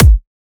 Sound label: Kick Dubstep